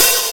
open hat sound d sharp key, Royality free open hi hat sample tuned to the D# note. Loudest frequency: 7329H